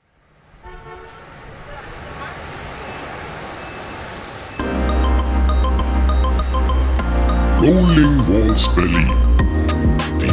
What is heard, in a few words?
JINGLE